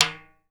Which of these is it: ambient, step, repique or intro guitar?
repique